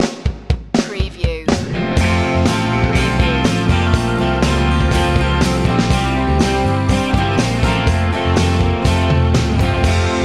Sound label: go country